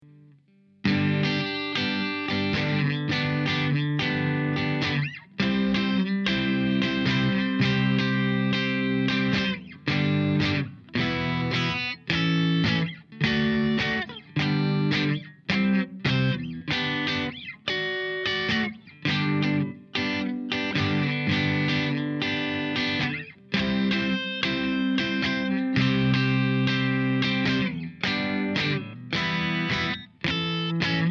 Shuffle; 6th Street Shuffle

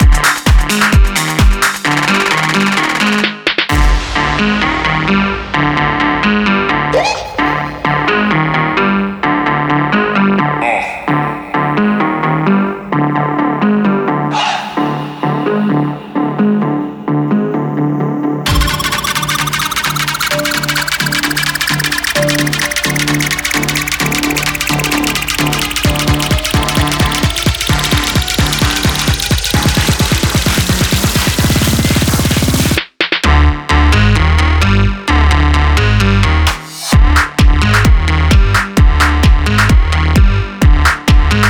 UK House

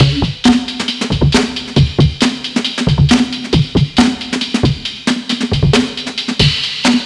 Amen Break Sim

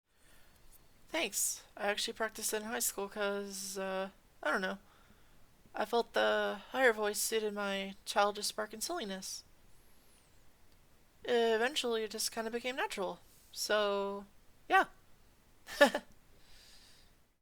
Cute voice i guess aaaaa

